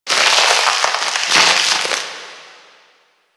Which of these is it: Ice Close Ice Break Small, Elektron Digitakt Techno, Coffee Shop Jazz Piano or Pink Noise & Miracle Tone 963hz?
Ice Close Ice Break Small